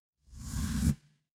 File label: transition riser